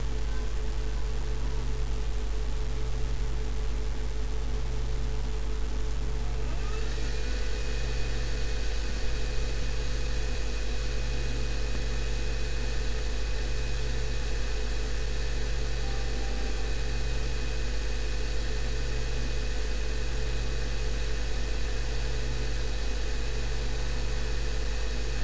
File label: noise, rumore; GFFX